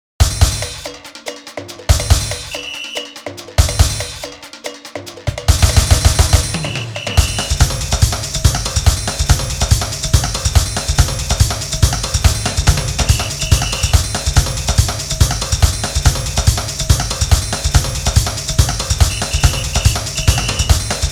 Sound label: samba rhythm